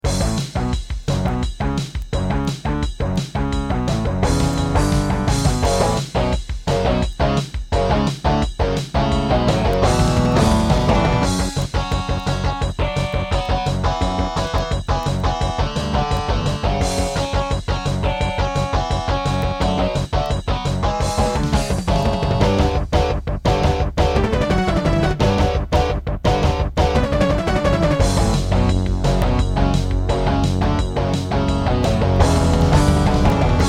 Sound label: Turtle Beach Tropez; Duke Nukem 3D